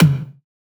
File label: tom, Drum One Shots; beacon; Slate; SD MIDNIGHT Sample Pack